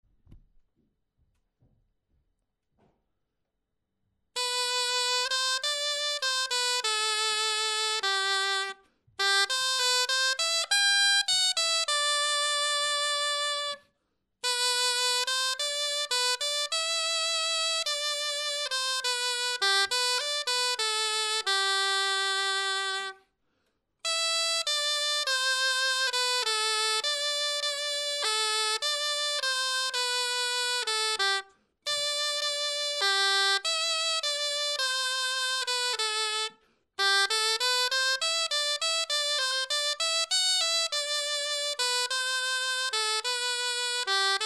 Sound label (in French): clarin etude